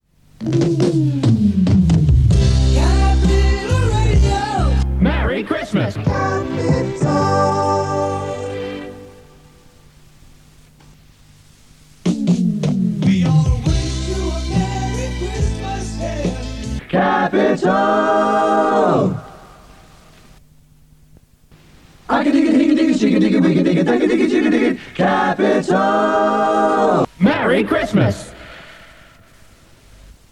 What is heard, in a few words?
Xmas Jingles; Capitol